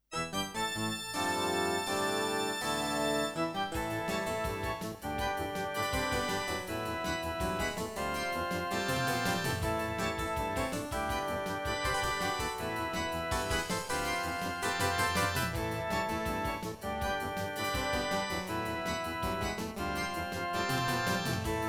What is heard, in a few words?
Intro, MT-32